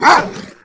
Bark

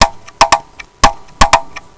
beat

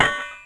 clank

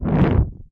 fire start

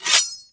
knife draw

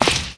footstep grass

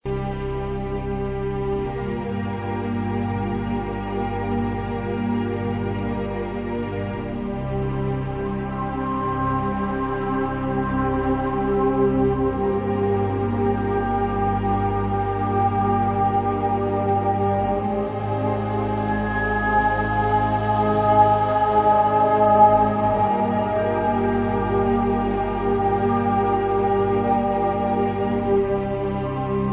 Music Desert

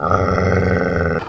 Growl

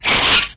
Rabbit attack

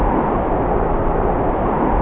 whoosh

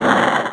skid

